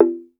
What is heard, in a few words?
TONGUE DR; TONGUE DRUM